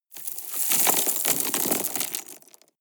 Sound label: drop rock debris medium